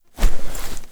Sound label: Foley Look At Figurine Start; Ambience; Gameplay; Drifter; Warframe; Duviri